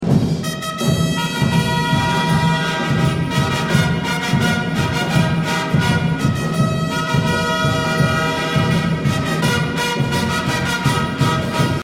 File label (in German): Berlin Fanfare